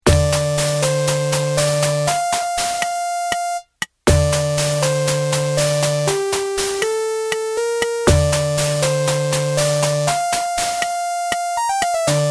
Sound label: yamaha Samp